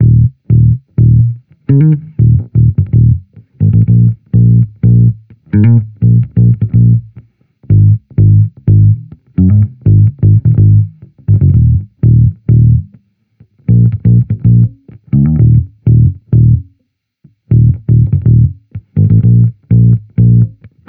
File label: Amped loop 125 Ebm, Electric Bass Loops; Slate Digital BASSHOLE Sample Pack